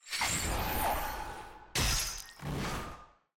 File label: prop thresh hook; lol